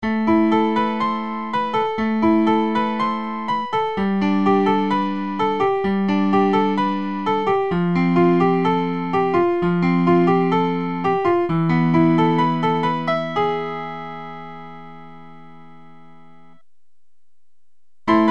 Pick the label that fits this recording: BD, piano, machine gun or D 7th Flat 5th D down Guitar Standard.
piano